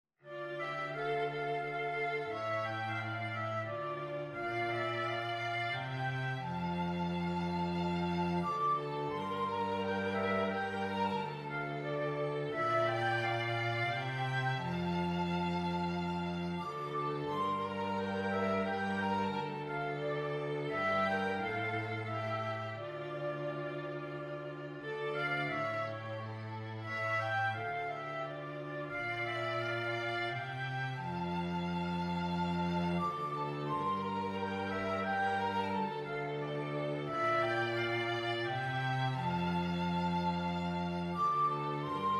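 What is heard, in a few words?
Oyfn Prepichik fl vln vc, flute violin cello; Jewish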